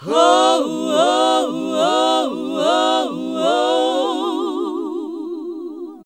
HAL GOSP. MIXED; Sound & Vision - Gigapack II CD 1 (Roland); HAL Gosp.Mixed 2

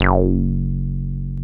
303 REZ F#1, ROLAND TB303; Big Fish Audio; First Call Vintage Keyboards

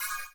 CYM, Ethno Techno; ILIO - Ethno Techno

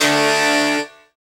synth, Roland, dance synth; Sound & Vision - Gigapack I CD 2

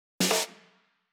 Drum Loop 128BPM Fill; VEC5 Drumloops; VEC5 Multi Loops 128BPM; Loop Elements

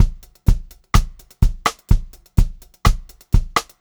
126 Pop-Rock Drumloops; 126 POP 4

